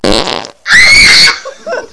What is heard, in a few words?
fart